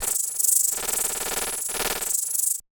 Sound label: Glitch Electromagnetic; Glitchedtones; Glitches